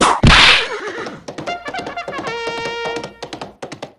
horse breakdown; taizou

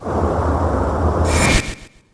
train transform; paynetrain

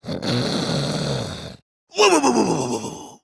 snore; monokid

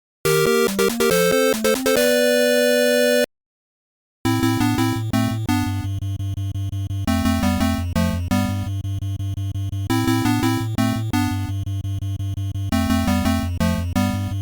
punch ouch

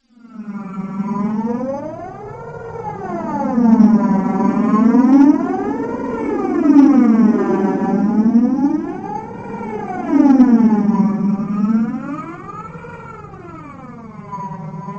broken wind, ambience; umi

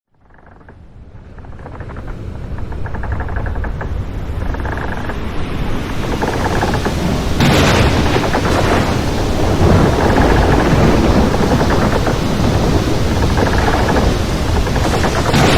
pirate breakdown

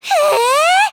"ehhh"; monophanie

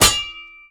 metal

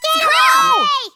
group shouting; monokuma kid

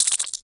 snake normal

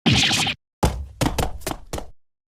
run

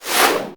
whoosh; sonic

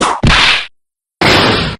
full whip; franziska

